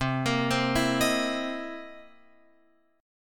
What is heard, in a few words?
C 7th Sharp 9th C Guitar Open F; arpeggiated; JGuitar